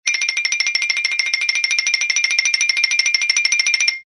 xylo high trill

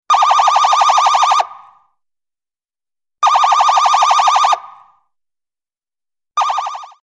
Attention Alert

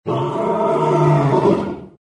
lion roar

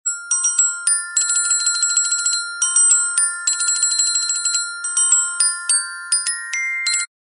orchestra bells military march

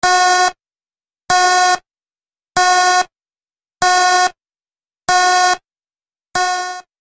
Alarm Electronic

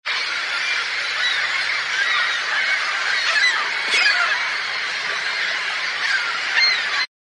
seagulls flocking

